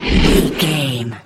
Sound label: troll monster breath